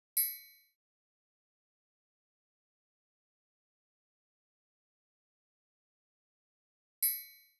Drum Loop 140 BPM Perc; Loop Stems; Cymatics; Mystery Pack Vol 4; Birmingham

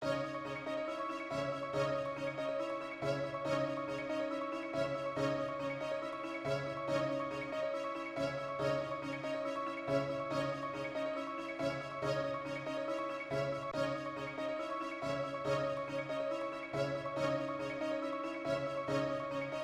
140 BPM C# Min Orchestra Violin, Loop Stems; Cymatics - 2022 Melody USB Expansion; Spartans

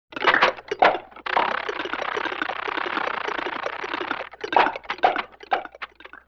Comedy Motor, Vintage Cartoon Foley

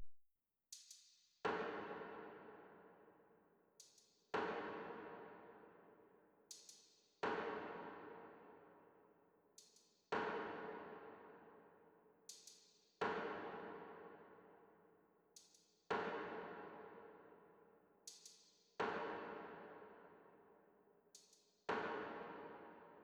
166 BPM F# Min Drums; Trap; Melodies; Loop Stems; Cymatics; Trap Starter Pack; Toronto

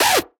Techno Synth Shot A; Synth One-Shots; Ghosthack; 45 Free Techno Synth Shots 2025

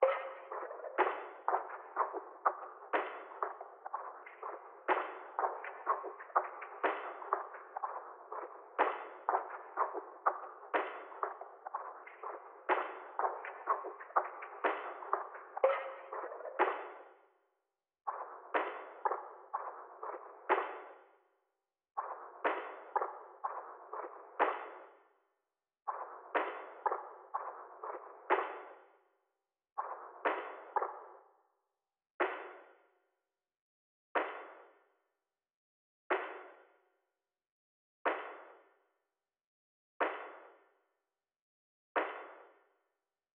123 BPM A# Min Percussion; Loop Stems; Cymatics; Completely Falling Apart

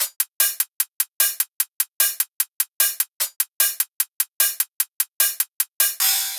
Hi-Hat Loop 150; Dubstep

